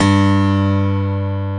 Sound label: PIANO G2; E-MU Formula 4000 Series Vol. 2